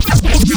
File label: custom bass